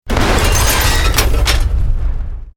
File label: EXPLOSION MEDIUM METAL GLASS